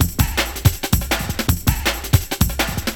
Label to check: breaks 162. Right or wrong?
Right